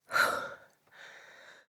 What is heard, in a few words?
Downed Breathe, Enemy; Lady Deora; Battle Barks